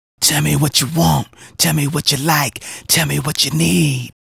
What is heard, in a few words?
Male Solo, Vocal